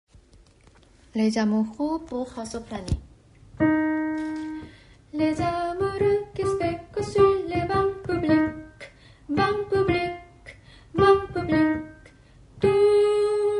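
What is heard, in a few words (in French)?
les amoureux soprano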